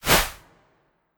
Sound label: Ice; Impaler Swo